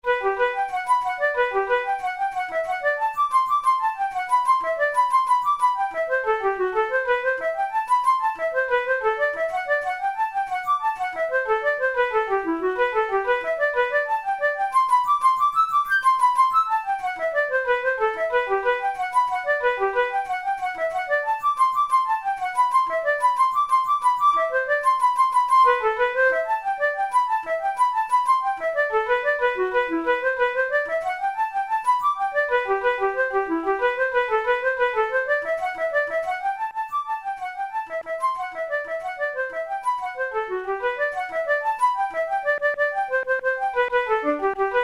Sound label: arpeggio, flute; cristaldi